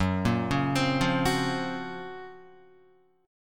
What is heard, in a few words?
Gb Major Sharp 11th, Guitar; GbM#11 arpeggiated